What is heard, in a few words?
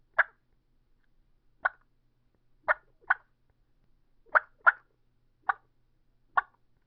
ultra mag 4 mouth call clucks, turkey; dixie